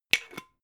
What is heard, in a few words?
glass bottle massive close cap short